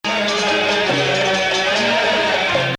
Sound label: Bayati Bayati; Bayati 1